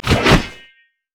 crit attack; Xin Zhao

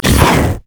dragon basic attack, monsters; LoL

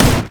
ancient golem basic attack; monsters

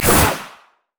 shadowswing; zed shadow

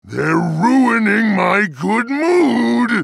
attack; Trundle

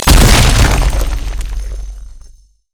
pain; LoL; trundle

